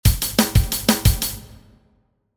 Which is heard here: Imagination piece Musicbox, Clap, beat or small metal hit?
beat